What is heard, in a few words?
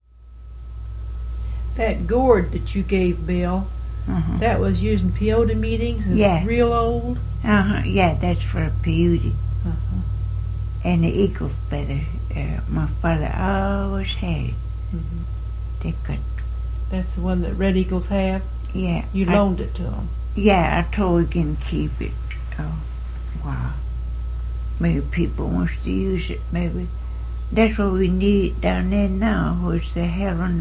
Gourd Fan Pipe